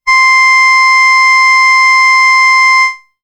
Oboe C

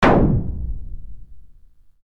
forge

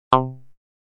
Jaw, synth lead

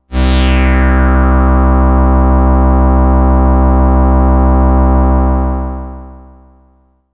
Sun Sigh D, synthpad

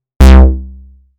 Bass, synthlead

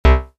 Triton, synth lead

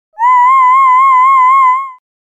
Opera, voices

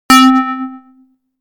Bell B; instruments

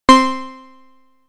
Three Pulses, synthlead

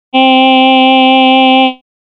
Voice aah

